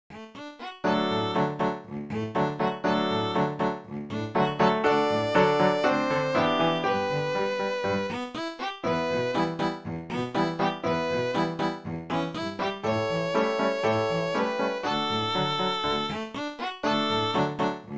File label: Piano Trio